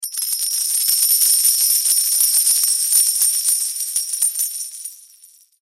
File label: Coins Drop Multiple, Coins Drop Multiple Pirate Gold; Coins Drop Multiple Pirate Gold Pile; Money; Foley; Objects; Blastwave